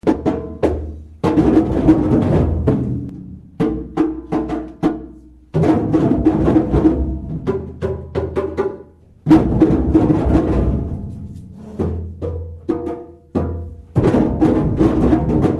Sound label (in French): tamb